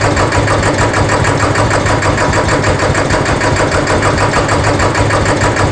generator

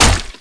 hit flesh

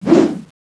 slash; CSO

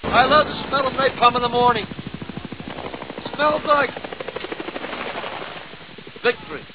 napalm

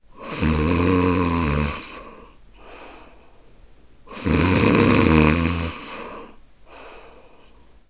sleepy, squeek

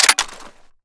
mp412 open, weapons

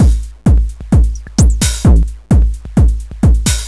bass

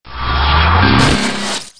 laser cut

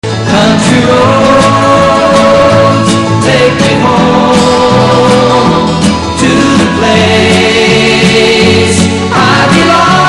country

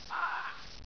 pl ahhhh, player

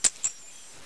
warehouse key got

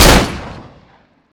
glock fire

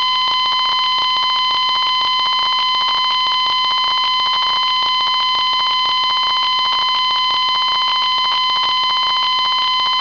flatline

